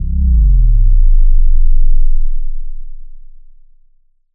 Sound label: synth fall; soundscrate